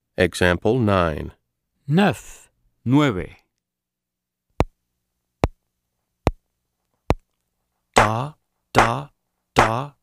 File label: Keyboard